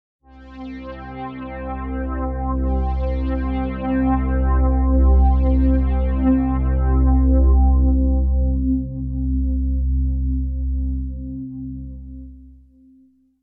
warmes 3 Ton Intro

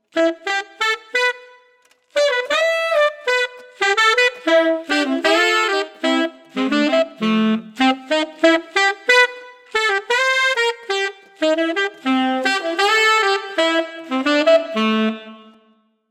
Saxophon Fanfaren Phrase

